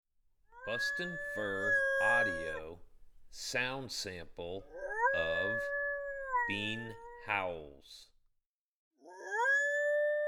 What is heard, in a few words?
Bean Howls